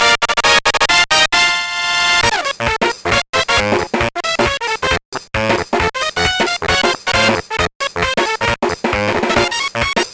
Last Lap; Banjo-Pilot; Spiral Mountain-Clankers River-Mayahem Temple

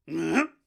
pirouette; Zote

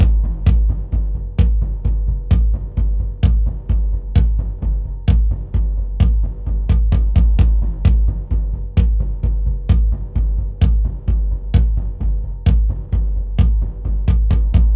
orb loop - bass drum

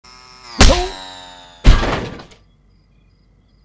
Kick (Bust In); Steaing The Diamond

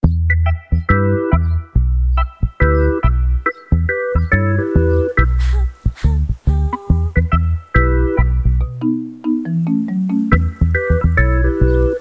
Reggae; So Blonde

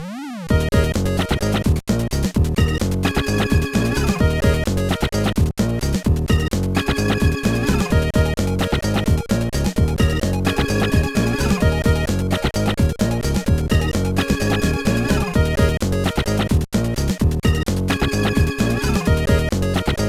Level Start; bomberman max 2; gba

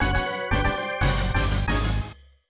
Battle Start; Custom Robo; N64 gamerip

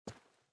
SFX step rock

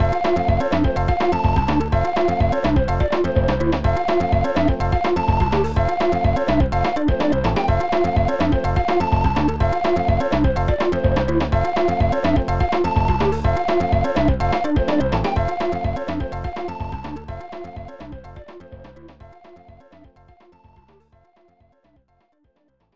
Power Up; pac in time 1993 snes

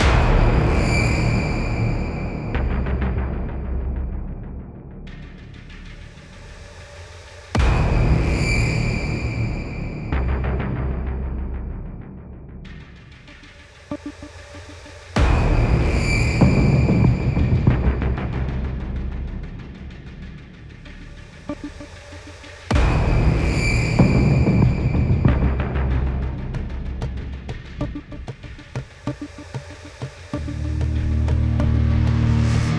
Induction Ambient

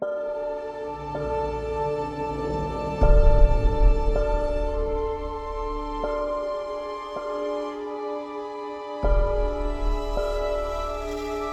Music Autumn Amb Night Intro

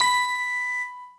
Ding; lego my style preschool

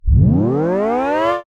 Tape Start; Robobeat

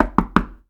Knocking On Door With Knuckles 3 Times Fast

